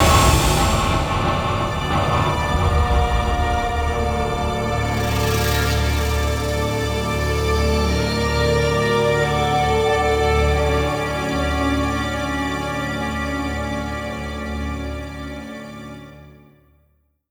Generic Ambient Combat End; The Darkness 2 gamerip 2012

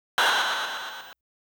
Shoot

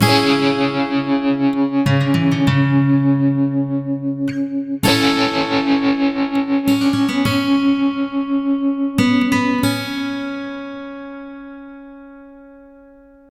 Stinger Mexican Short

